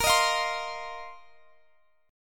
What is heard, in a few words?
A sharp Suspended 2nd Suspended 4th A sharp down Guitar Open F; strummed